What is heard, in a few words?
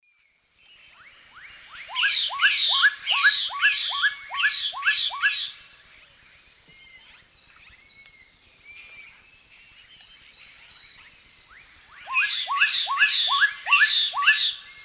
Donacobius atricapilla, duet; S. America; Eastern Lowlands